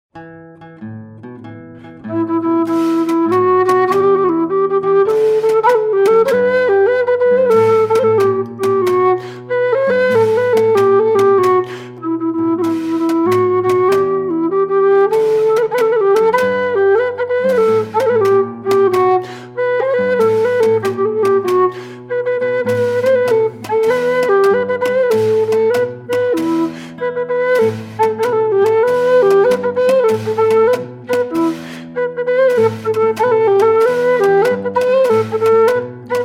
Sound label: Tarantella; Tarantella 1